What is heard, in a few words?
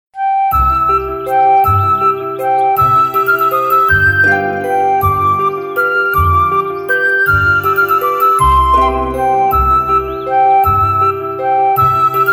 Flute, ringtones; Coolpad 7296s